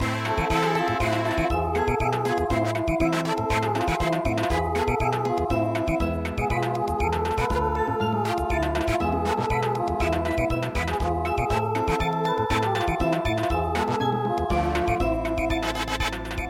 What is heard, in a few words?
Samba